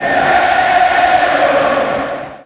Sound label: FANFARE; hb6demo